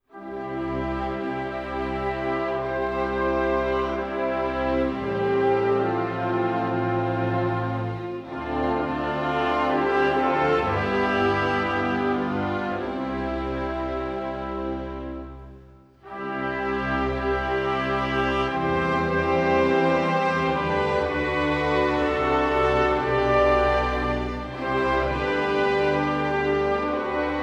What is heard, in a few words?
National Anthem of Luxembourg; Lucembursko